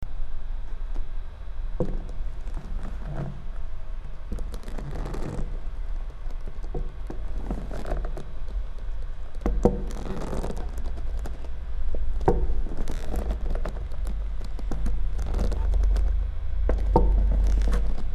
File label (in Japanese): ashioto